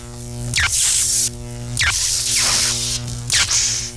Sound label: electrical